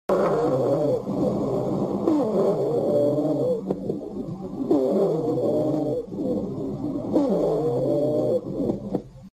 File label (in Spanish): sibilancia polifonica